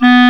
CLARINET B2; AKAI S6000 CD-ROM - Volume 1; BrassWind

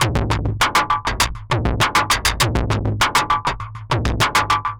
perc 100 stomp, Percussive Loops 100 bpm; Sample Magic - Transmission-X

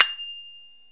FING CYMBAL, FINGA CYMBALS; Zero-G Ambient 1

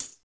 tambour, tambo shaker

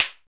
PRC SNAP; Roland - Rhythm Section; Latin 4

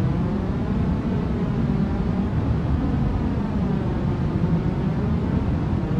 Panned Noise, non tempo loops

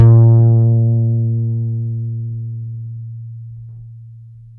HOLLOW FRTLS, Bass; Spectrasonics; Bass Legends

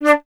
flute D; Miroslav Vitous - Solo Instruments VOL-2; Solo lnst. II

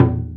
Korg N5 Rock Kit C#3

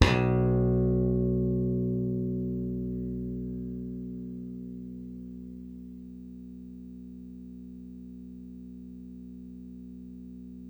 SLAP BASS, Electric Basses; PlatinumPhattCD2; E-MU Producer Series Vol. 8 – Platinum Phatt

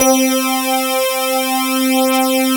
BELL SYNTH

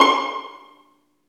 String Vlns Pizz

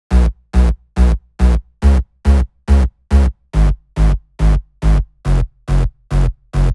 140BPM B; Vengeance - Essential Clubsounds Vol.4

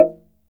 SFT SLIT DR, Heart of Africa; SLIT DRM2

